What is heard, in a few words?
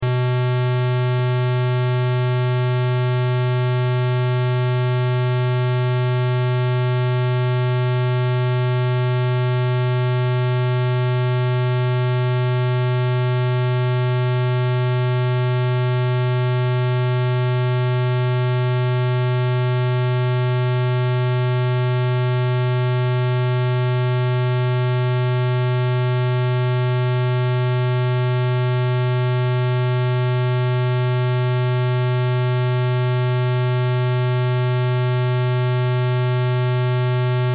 Test Tone Square; Wave 125Hz 60 Secs -18Db Loop